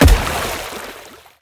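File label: skill burst shot water impact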